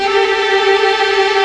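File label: MAJOR TRILL G3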